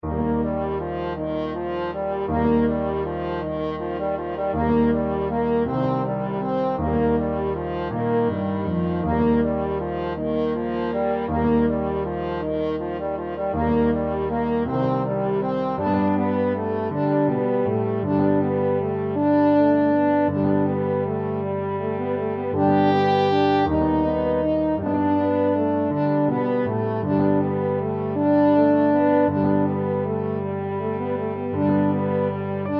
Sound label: french horn duet